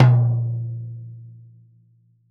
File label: velocity tom; VELOCITY TOM 1